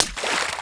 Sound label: Splash